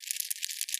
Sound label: rodent scratch short